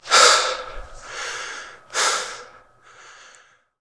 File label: Prisoner Catch Breath; Prison Architect